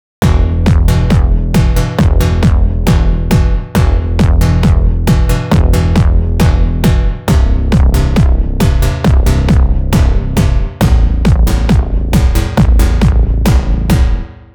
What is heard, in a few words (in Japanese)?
ベースの打ち込み